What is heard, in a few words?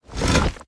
scoop; Smite; Cabrakan